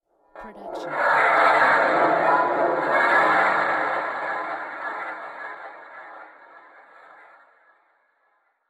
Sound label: horror metal chaos; soundscrate